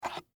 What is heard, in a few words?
Game Piece Slide, Plastic, Pick, Up, From, Board, Hotel